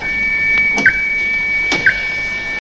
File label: ship bleeping